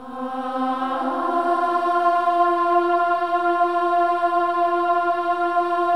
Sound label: Voices, INTERVALS, 4TH UP; Spectrasonics; Symphony of Voices